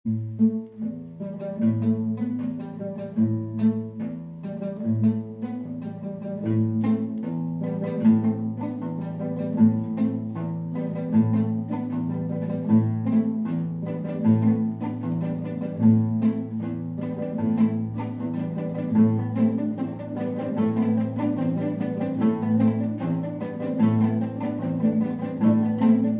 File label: Kalimba